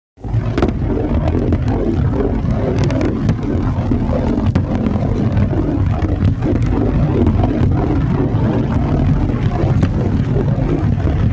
Bowling Ball Roll Eff, bowling

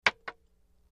Wood Staff Hits Multi

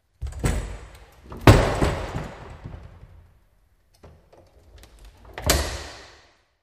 Double Wood Doors Open And Close In Rev; latch, metal

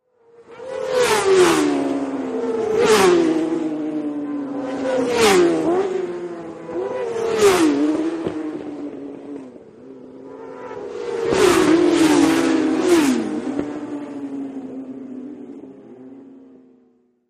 Motorcycle Road Racing

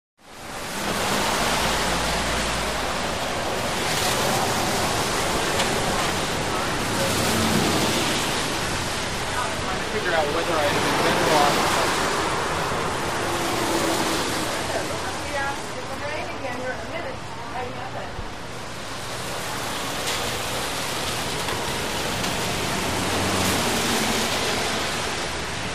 Very Wet Traffic Bys, wet traffic

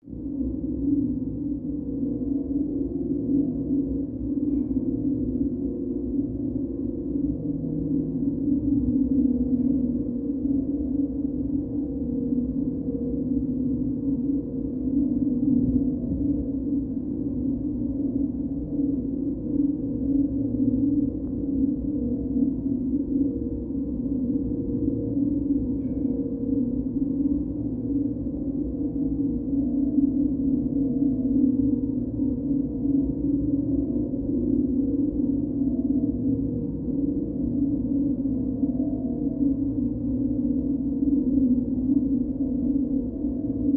Ambience Machine; drones & tones

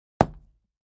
Patio Block Hit Sledg, concrete & brick

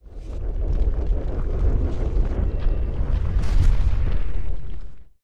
Impact Tremors, earthquakes

